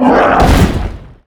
Dead Shoveler death; Kubera Mine

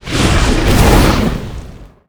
Swampmon spit; Swamp Of Darkness

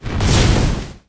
Ignition Bomber; Alchemist; Alchemy

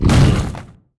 gnome hit; Akeron

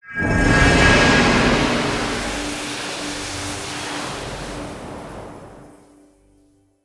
healing; Swamp Of Darkness; Liquimon

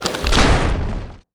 death; Crypta; Karutan

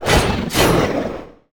attack; Paraca

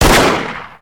Gun Attack Magic Gun; GunCrasher

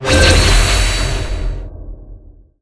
obelisk clear; Acheron; Arka

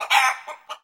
Chicken DMG; MUDevs S20.1-3

